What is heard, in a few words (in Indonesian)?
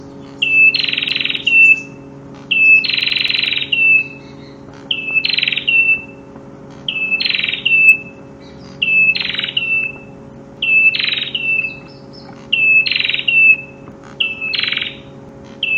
prenjak master ciblek